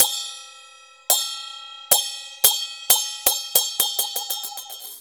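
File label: CHINA SM4 R, China cymbal; Spectrasonics - Heart of Asia CD2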